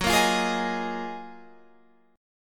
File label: F sharp Major 13th F sharp; F#M13 Chord; strummed; 14,13,15,13,16,16